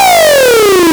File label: Synth shot B